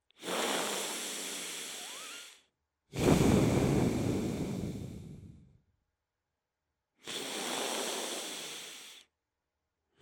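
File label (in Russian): звук дыхания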